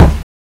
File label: kick